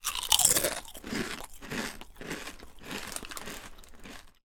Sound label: human eating potato chips crisps